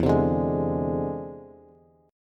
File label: E Augmented 7th Bass; 12,15,12,13; strummed